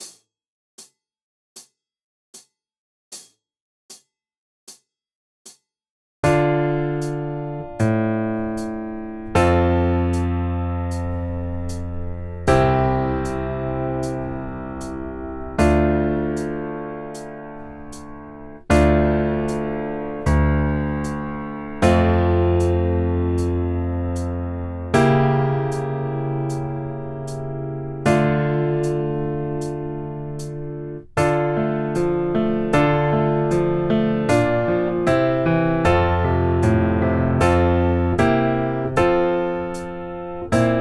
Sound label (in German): Etuede d moll